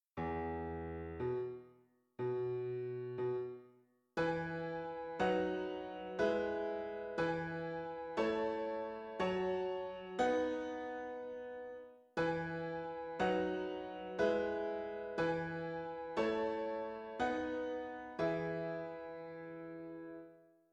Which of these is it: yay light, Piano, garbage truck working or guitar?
Piano